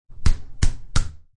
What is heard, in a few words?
Three successive punches.; Punches and Hits; Combat